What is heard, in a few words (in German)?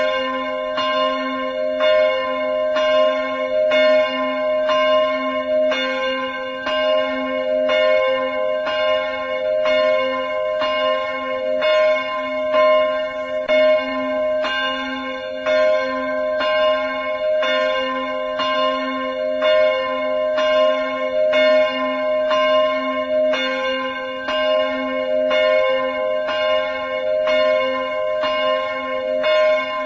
Taufglocke c2